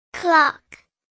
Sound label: chicken